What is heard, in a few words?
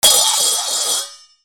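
percussion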